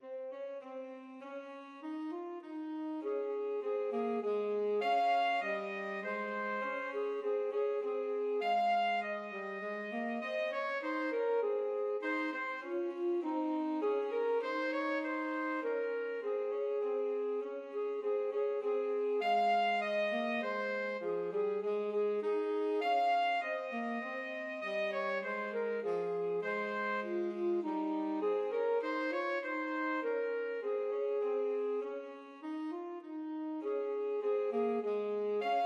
alto sax tenor sax duet; holly ivy

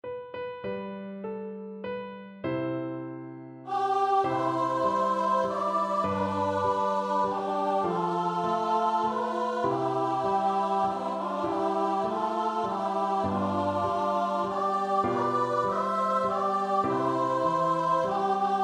choir

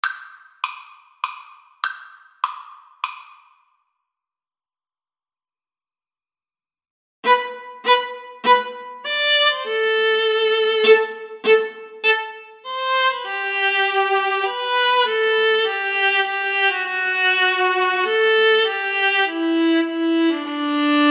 verdi la donna, Viola Cello Duet

